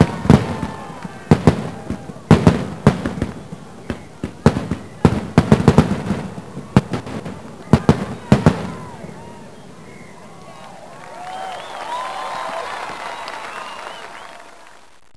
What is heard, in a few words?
firework